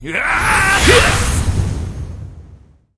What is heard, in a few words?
silverwood attack, monster